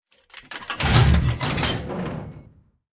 cannon 380mm mech; cannons tanks

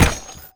impact ammo box light

